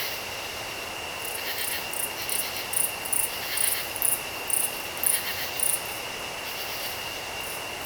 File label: cricket; INSECTS